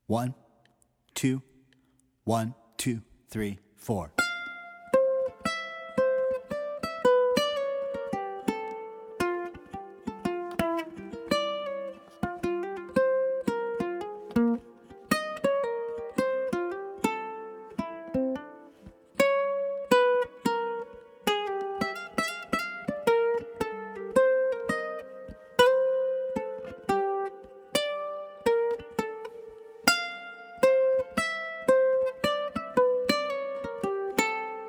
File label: uke